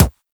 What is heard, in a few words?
Weapon Punch Pistol; Warframe; Weapons